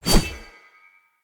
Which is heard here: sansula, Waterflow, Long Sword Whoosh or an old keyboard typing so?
Long Sword Whoosh